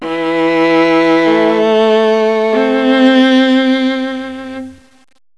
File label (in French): VIOLONCELLE; Mobiclic46